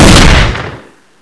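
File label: double barrel shoot, weapons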